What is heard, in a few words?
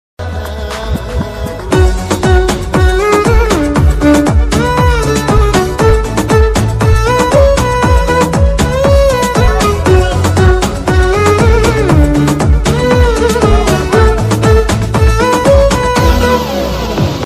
heart attack ringtone heart attack sad bgm